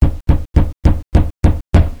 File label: knock door